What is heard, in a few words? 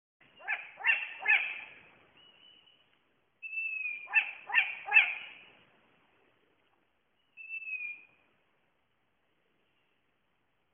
Birdcall; british-birds